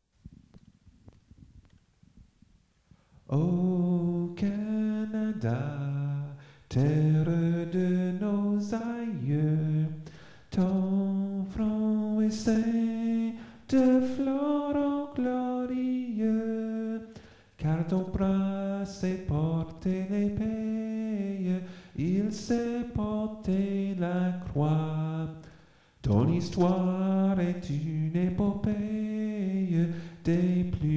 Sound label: o canada french version